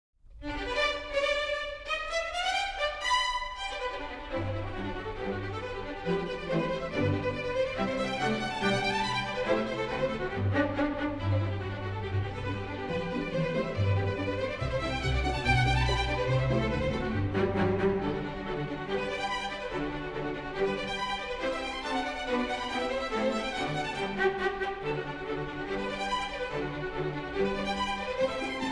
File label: violins together